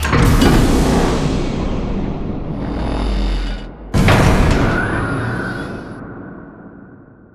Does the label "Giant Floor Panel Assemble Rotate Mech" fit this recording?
Yes